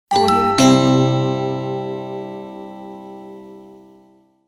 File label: antic game ding